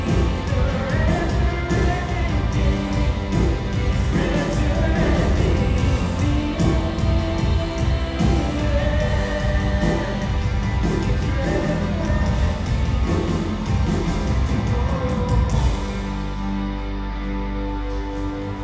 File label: rhythm